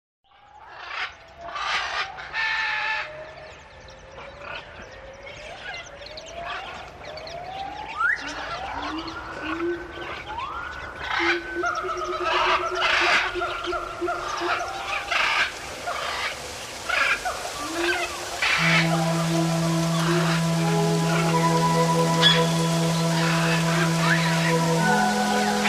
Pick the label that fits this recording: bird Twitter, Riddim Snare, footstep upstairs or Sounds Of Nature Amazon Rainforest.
Sounds Of Nature Amazon Rainforest